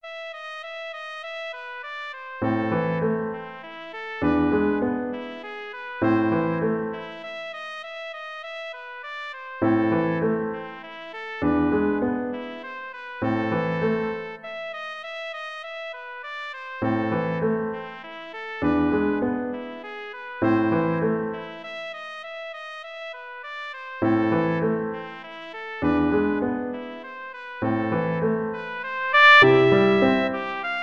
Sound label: beethoven fur elise, trumpet